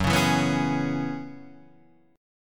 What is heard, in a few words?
F sharp 9th Suspended 4th F sharp, chord